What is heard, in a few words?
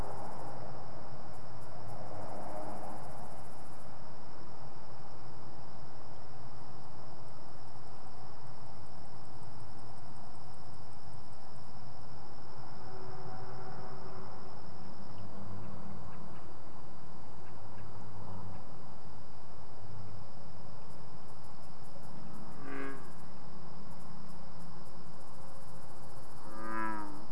Frog Recording, Wildlife Safari Park